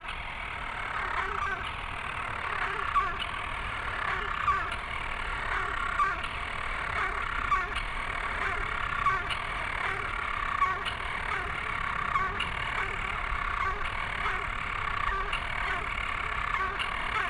purring; Mousa

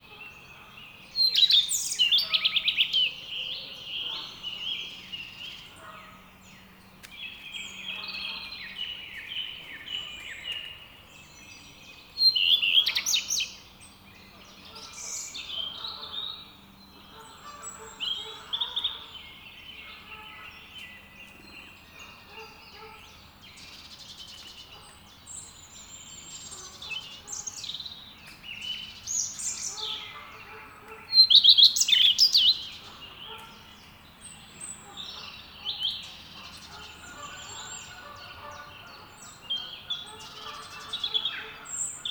song UK

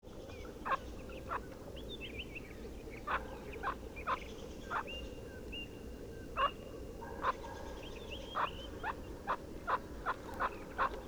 Calls of a female later joined by a male

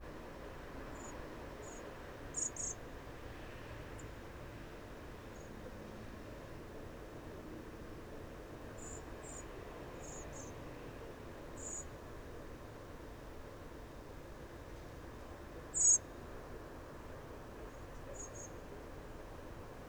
many

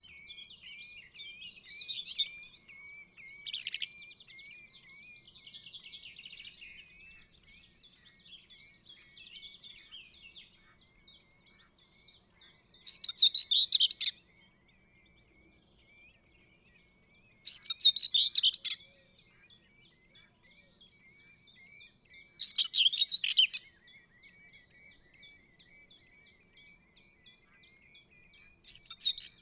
Common Whitethroat Male Singing

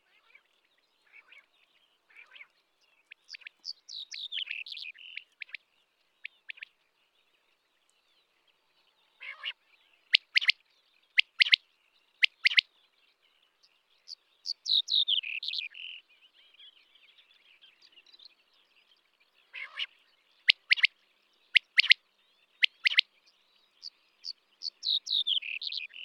An assembly in Kazakhstan

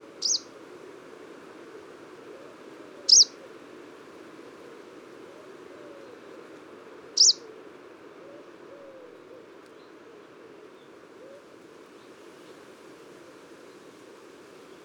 viridanus call calls

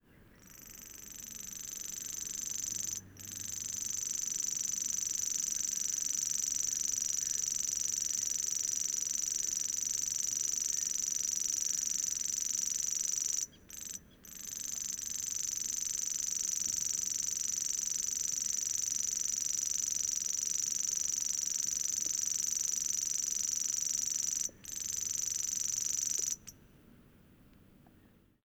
Reeling Song

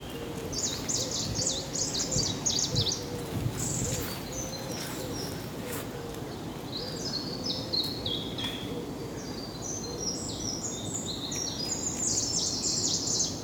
song, vlaardingen

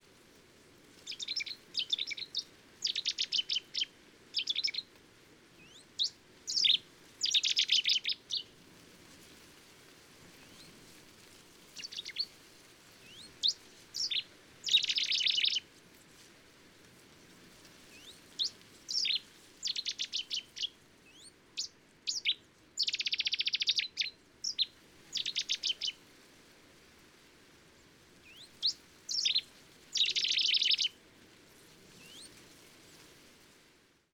dispute

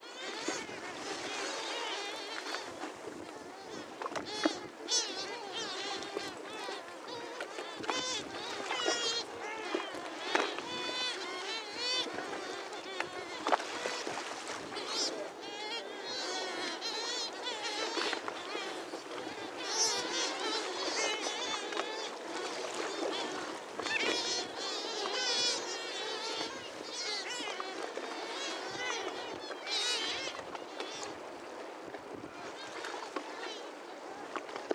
Great Shearwater

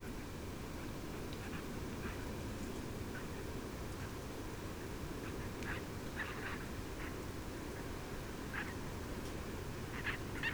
chatter

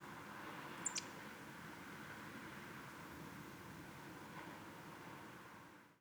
weird

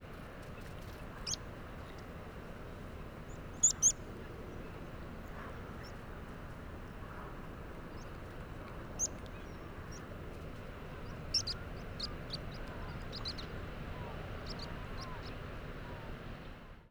Meadow Pipit Variable Flight Calls Of Migrant

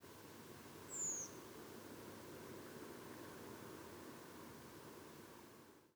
hindleg, Redwing Turdus iliacus; nocturnal flight calls; Sagres, Vila do Bispo, Portugal, 01:10, 15 November 2017

